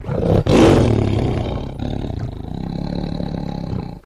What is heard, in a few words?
Tiger Big Scare; big cats